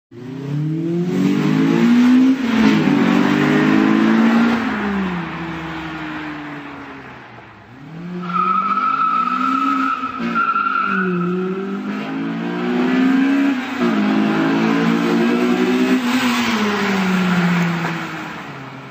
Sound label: sound kandn airintake; acci bastuck